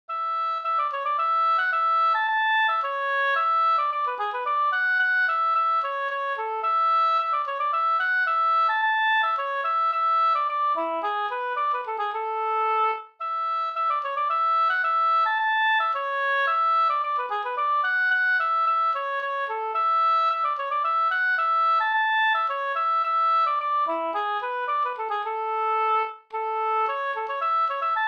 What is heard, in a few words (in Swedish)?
polska Brudpolska; Brudpolska efter Lyckner, Österfärnebo, spelad av Jansas Arne Eriksson, Gästrikland